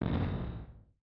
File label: 8bit Explosion